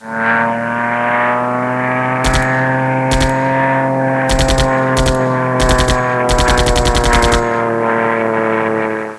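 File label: air combat; APOCALYP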